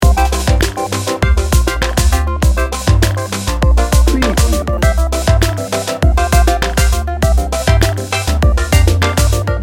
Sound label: electro motion loop